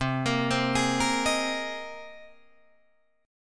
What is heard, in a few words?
C Minor 7th Sharp 5th C Guitar Drop D